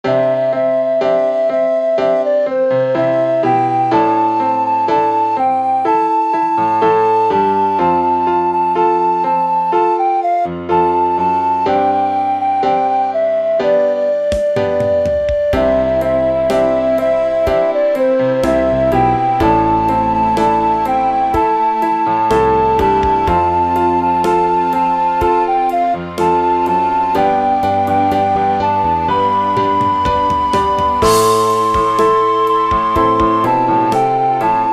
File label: ballad